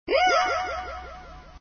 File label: die; pendekar; Aventura